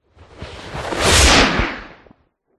caped superhero zoom past or impact cape flap fast